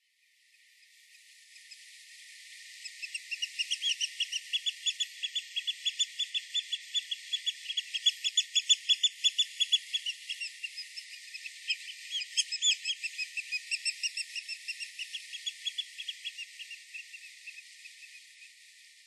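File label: Eurasian Oystercatcher, sanbanse; miyakodori; koe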